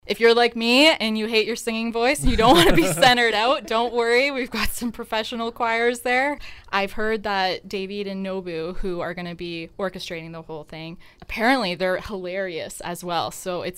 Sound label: choir